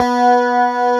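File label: ESP B4, chorus guitar; East West - Global Sounds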